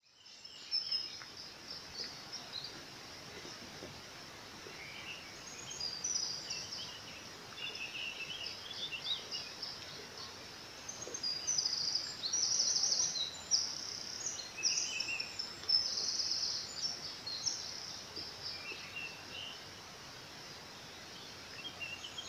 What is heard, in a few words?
Birds In The Forest